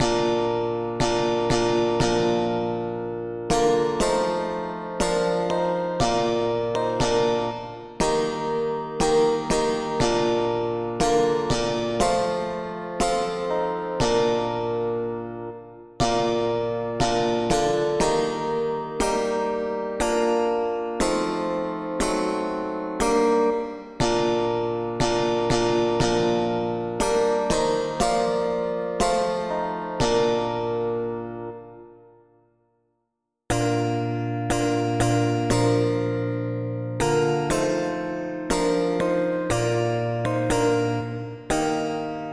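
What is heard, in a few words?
polyinstrument, hymns; Enfants de Dieu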